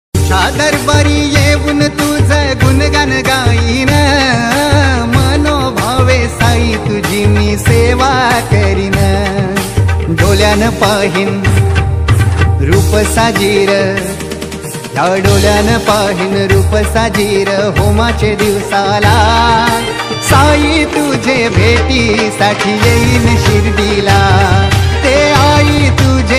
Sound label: marathi Ringtone; Sai Baba